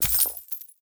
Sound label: mummy hit